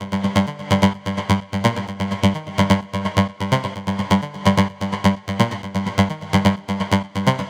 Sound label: Melodic Loop Gmin 128, Melodic Techno; Progress; Ghosthack; AC2024 - Day 12 - Melodic Techno